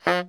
BARI FF F 2, saxophones; Greytsounds - Philip Wolfe David Crozier's Saxophones; BARI SHORTS

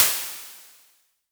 Crashes; LIMAT Div Dept; Drums

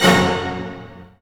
HIT ORCH.; Roland - Brass, Strings, Hits and Combos; Orch.Hits 1

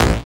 BD, Dance

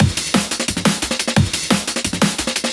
KickSnareLoop; neuro; InsideInfo; Drums

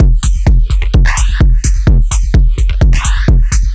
Machine Drums, 128bpm, analogue circuit; musicradar

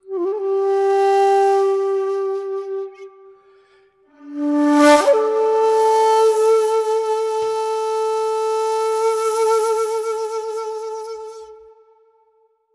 Shaku, Wind Instruments; Sonic Foundry (Sony Creative Software); World Pop

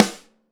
DRY SN, STUDIO DRY SNARE

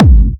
Clubby Kicks, Bassdrums

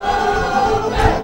VOC, Gipsy

